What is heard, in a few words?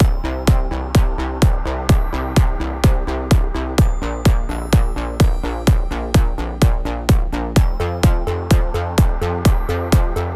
BGM dungeon labor